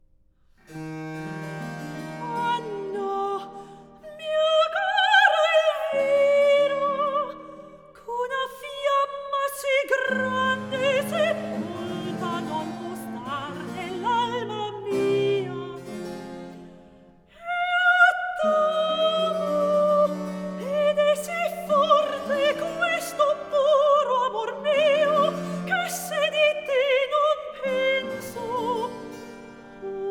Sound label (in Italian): Cantata Recitativo; Tremori al braccio RV 799